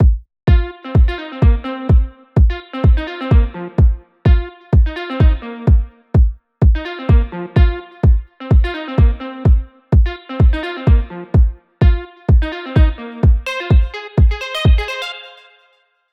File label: Second Pattern with Kick